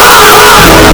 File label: fahhh boosted